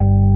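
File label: STOP organ, JV 1080; Pro-Rec - Synthsonic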